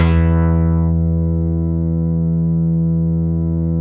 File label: digital, Bass Analog & Synth techno; USB Soundscan vol.12; AKAI